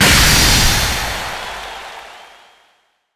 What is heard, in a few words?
bigwin Start; netent